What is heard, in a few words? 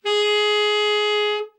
tenor soft, sax; Giga Samples Collection